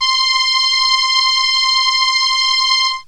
Solina Trumpet C5, Analog Synths; Ableton Foundation FreePacks

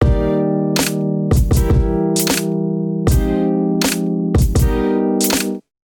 Road Monger Kit groove 79 bpm; MIDI Clips; Ableton; Factory Packs; Beat Tools

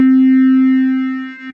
This Is Not An Organ, Synth Keys; Instruments; Ableton; Synth Essentials; Operator

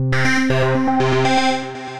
Arp, melodic arps; Space Cowboy Arp; Ableton; Mood Reel

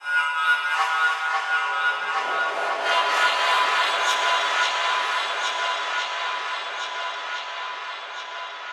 Deep Texture - 110 bpm; MIDI Clips; Tonal; Atmospheric; Ableton; Factory Packs; Mood Reel

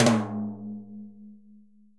Timbales L Flam, Latin Percussion; Drum Hits; Ableton; Factory Packs

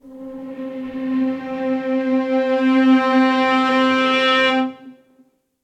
Glacial (Slide) - String Quartet; Instruments; MPE; Ableton; Factory Packs; String Quartet by Spitfire Audio

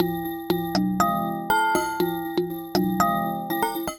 Percussion Kit, Synthesized; Drums; OP Percussion Kit; Ableton; Factory Packs; Drum Essentials

